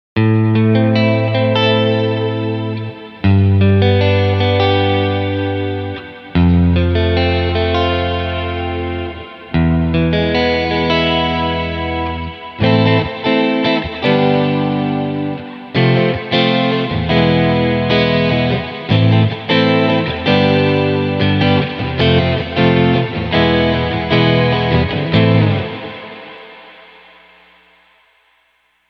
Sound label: magneto; bigsky